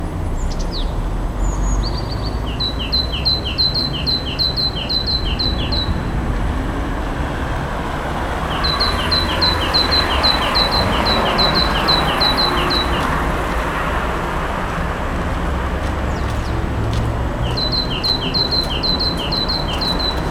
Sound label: P major song